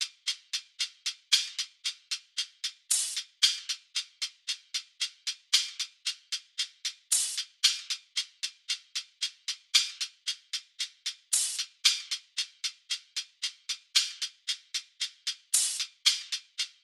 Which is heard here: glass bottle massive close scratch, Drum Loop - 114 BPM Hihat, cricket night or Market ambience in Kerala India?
Drum Loop - 114 BPM Hihat